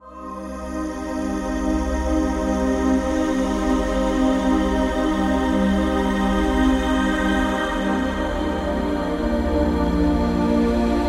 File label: ambient piano